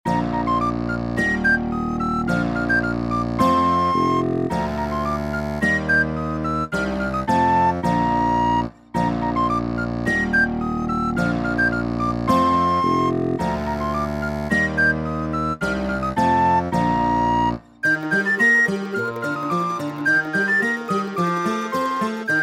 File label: Mermaid hunting music